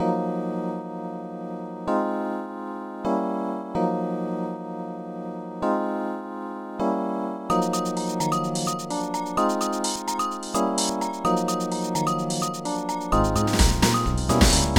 lounge

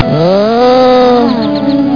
MMMMMMMM

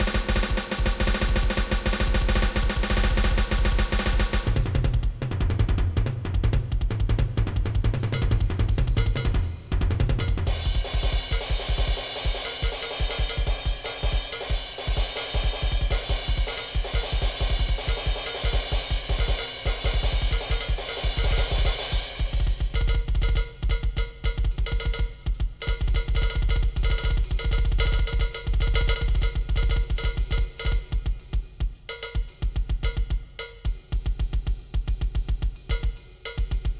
drum solo

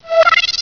Disrupters; Bedlam 2 1.1.1; Bedlam Sounds

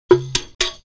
new Game

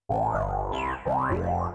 synth house 125 A; eJay; Keys

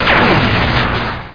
phasers; solotrekii

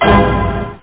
Hit Effect; Realmz 6.1.3; The Family Jewels

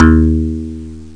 slap bass; 707

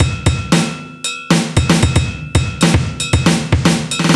drum

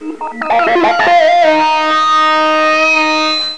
Squeal solo; meetmediaband

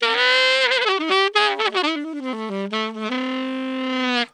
SAX; Mäusejagd Interactive Demo; SOLOS

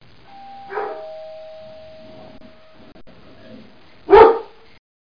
WOOF

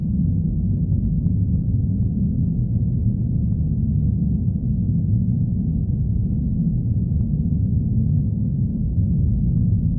ambloop; DAoC

